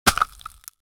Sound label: a soft muffled thunk, short decay